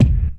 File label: Kick, Drums & Percussion One Shots Drums